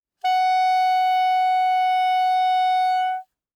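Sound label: Soprano Saxophone non vibrato ff Gb5; Woodwinds; Bb Soprano Saxophone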